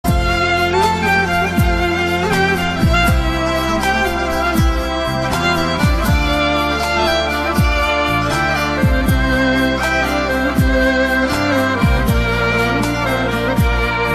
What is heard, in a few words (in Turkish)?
huzur dinlendiren